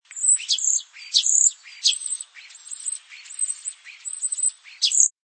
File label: starling